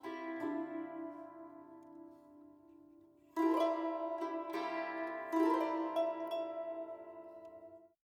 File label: String Sarangi Pluck Free Time C